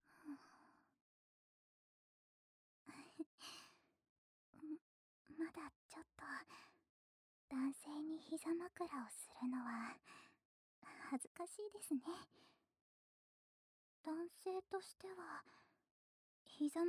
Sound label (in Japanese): ゾクゾク 耳かき 体験【右耳】